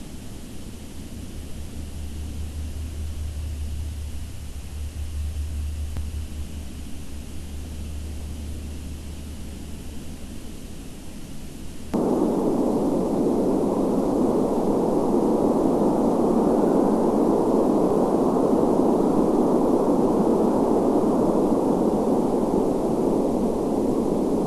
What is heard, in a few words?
WFR audio emfisis